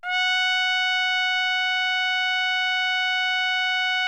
TRUMPET; Best Service; Killer Horns

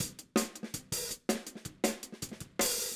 Hi-Hat, 162 BPM; Drums; Drums from the Big Room The Mixes; Take Your Toms Off; Verse

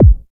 DB KICK, TECHNO; Drums In Your FACE!

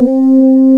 VOICES; East West - Global Sounds; PPGWAVE22

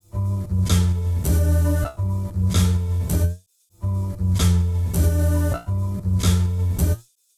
Bas Fx Loop 130; Music-Fx Loops 130; Equipped Music; Loungin House

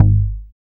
BAS MKS-50, Roland

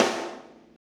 SNARE hard, Drum Kits; Big Fish Audio - DOM; Redrum

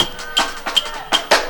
Rainfall Lite Break, breaks

